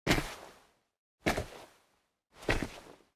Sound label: old man walk